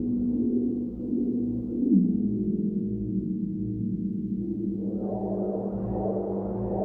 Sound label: SPACE SHIPS; SpaceSounds